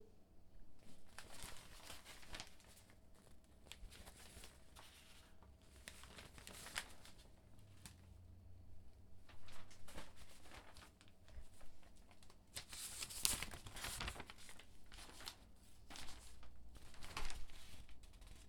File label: Newspaper